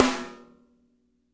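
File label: piccolo snare left, NATURAL KIT; Spectrasonics; Burning Grooves CD2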